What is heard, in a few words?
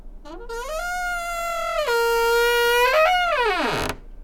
Low Creak, Door; FXHome; Squeaking